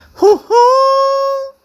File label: hohoo